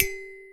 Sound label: ping; Cyberpunk BreachHaxx0r; elderscrolls; eso; ability reminder